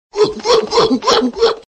gorila, sons de animais

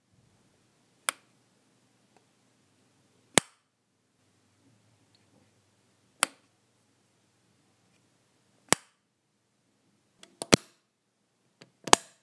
interruptor

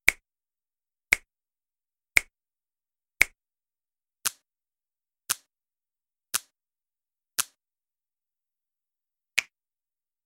estalando dedos efeito sonoro

